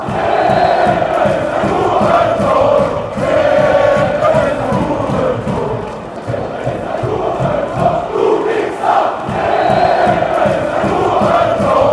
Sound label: having a go, fan chants; aacheners